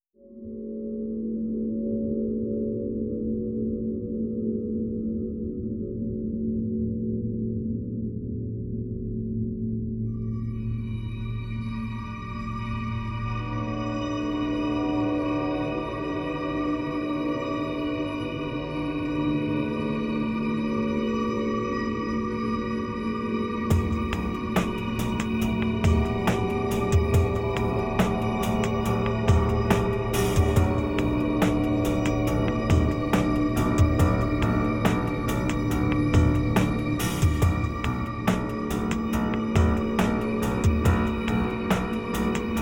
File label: Distorted; Shinigami Kareshi Series Original Sound Track CD